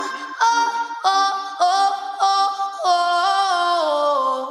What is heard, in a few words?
apple iphone xr sms ringtone